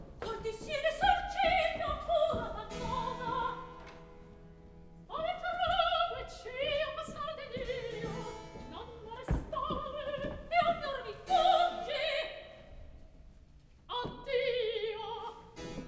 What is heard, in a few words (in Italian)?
Atto Secondo Recitativo; Cinna, Celia; Mozart; Operas; KV 135 Lucio Silla - 1772